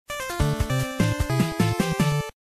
music act intro, nes; ninja gaiden

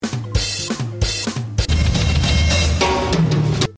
rimshot gone wrong

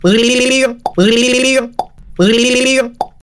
weird ringtone

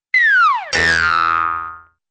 fall and flat twang

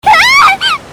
screem

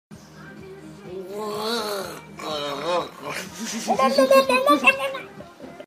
a little brain fart

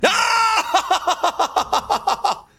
hunter laugh

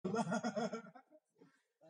bohohoh

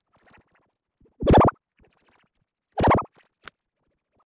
berrp

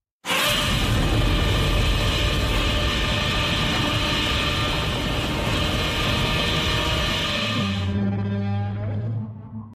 long roar; godzilla

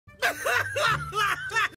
laughing; richard watterson

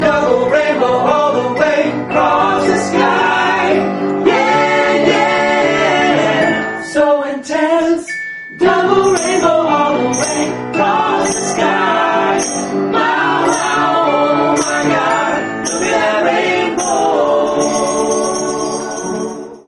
song

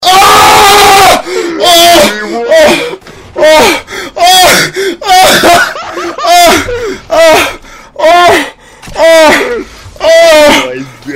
orgasm

